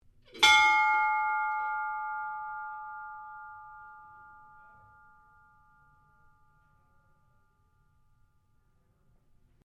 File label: SHIPS BELL DUMB HOPPER BARGE RING; Ships Bell Dumb Hopper Barge 10 1885; Various ships bells; Boats; Vehicles